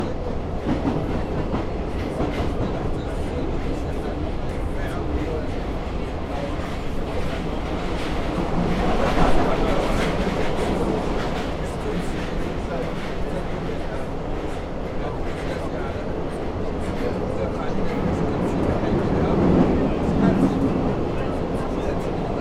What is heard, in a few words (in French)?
metropolitain de paris